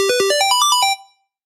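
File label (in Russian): zvuki dlia uvedomlenii na telefon v stile 8-bit, 8-bit style phone notification sounds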